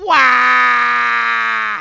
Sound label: waaaaaaa; wario